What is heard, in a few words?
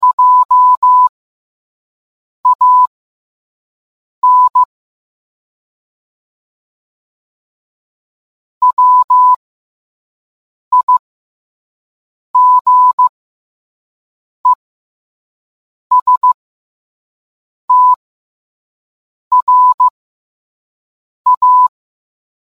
morse; wigestrand